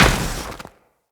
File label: Moa Combined Step Snow, Footsteps